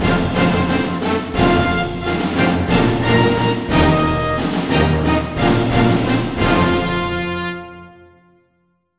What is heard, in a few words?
Victory; Heroes Over Europe